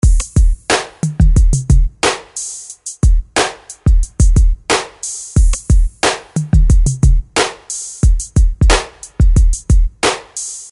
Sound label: german hip hop style drum loop